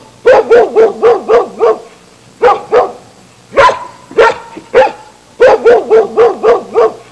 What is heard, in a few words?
black labrador barking; pets; Sound Effects for Multimedia - Volume 1